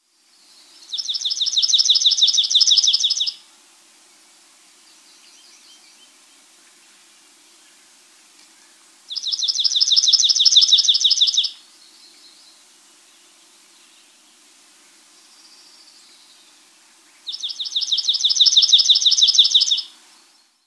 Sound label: Bird Songs Palm Warbler